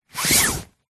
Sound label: foley clothing nylon thin pull rub scratch sound